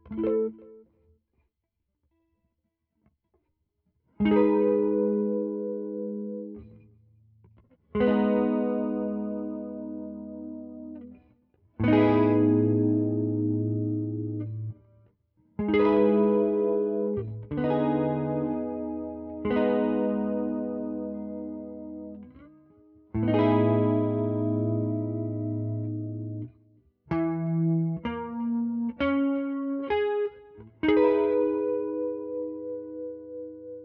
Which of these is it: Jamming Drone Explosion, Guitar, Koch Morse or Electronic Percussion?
Guitar